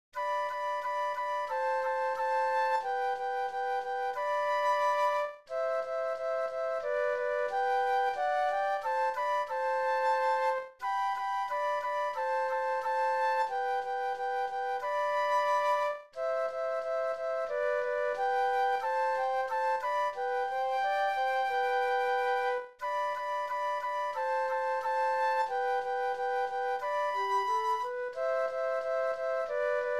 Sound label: Flute Duet; christmas